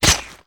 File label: Sword Impact Through Flesh; Weapons & Explosions